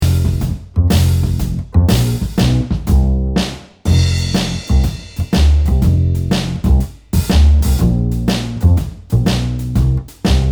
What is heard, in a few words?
One Potato Two Potatoes Instrumental